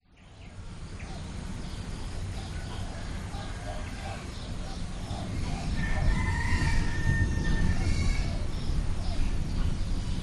nature rural ambience pigs chickens birds insects approaching thunderstorm bali; indonesia